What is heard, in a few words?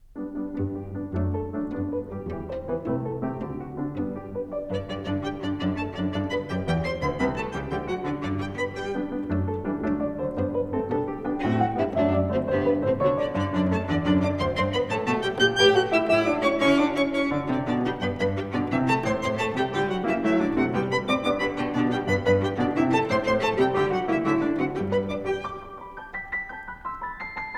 in G Major, Cello; 10 Variations on Ich bin der Schneider Kakadu; Op. 121a Variation X